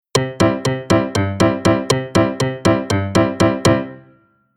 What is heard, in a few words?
Rhythm